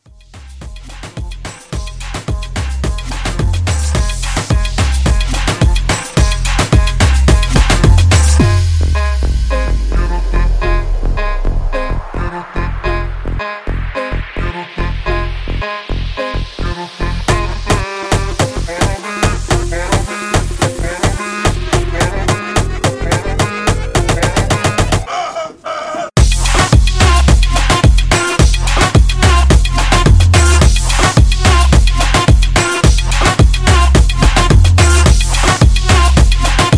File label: Moomba Re Drum 108